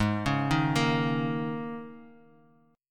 G sharp Suspended 2nd Suspended 4th, Guitar, Half Step Down